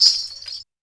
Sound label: METAL, Afro Shakers, Ankle Pods; Roland LCDP14 Africa VOL-2